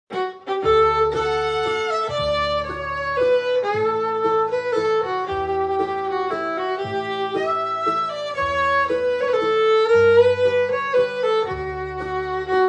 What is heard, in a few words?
st martins waltz, Texas Fiddle